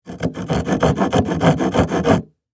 Metal File Scrape, Industry, Metal, File, Scrape, Wood; Hand Tools (Sound Effects:Tools)